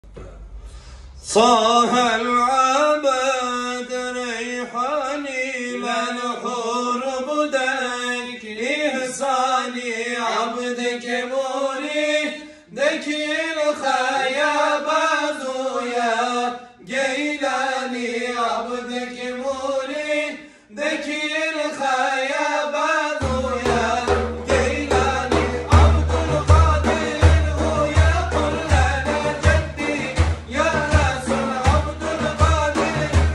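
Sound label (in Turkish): defle zikirle coşuk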